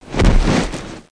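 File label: body hit; Total Chaos Nukem 3d Pack; duke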